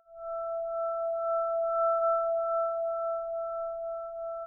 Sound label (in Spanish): campana loop